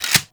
Pump Shotgun Cock Stop C; Weapons; Warframe; Zariman

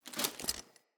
Battle Dog Foley Jump; Snc Battle Dog

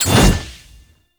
Projectile Aim; Warframe; Cephalon Weave

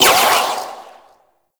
Charged Shot, Auto Cross Bow; Warframe; Weapons; Tenno; Prime Ballistica

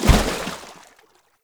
Step Water, Footsteps; Characters; Enemy; Mendicant; MndKing

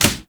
Melee Fist Impact Generic; Fists

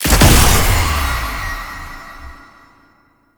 Jump Pad Launch Local; Gameplay; Warframe; Jade Shadows; Ascension

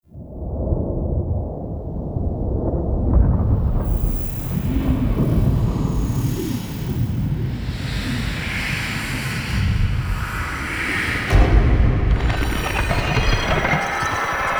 Nursery SFX; Orbiter Nursery; Cinematics

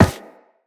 Operator Run Metal, Footsteps; Characters

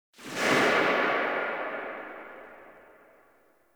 Pistol Tail B; Tenno; Bard

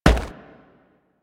Crewman Footstep Run; Warframe; Corpus

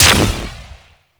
Sapient Primary Explosive Impact; Weapons; Warframe

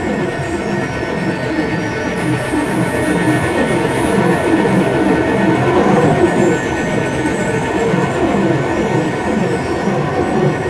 Elevator Lift Loop; Tenno Hub

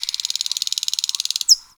Forest Bird Rattle; Ambience; Warframe; Grineer

